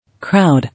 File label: Crowd